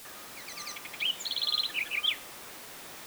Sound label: House Wren; woods; Ariel Foundation Park